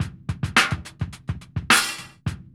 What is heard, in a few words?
LOOP; E-Lab; X Static Goldmine 2; akai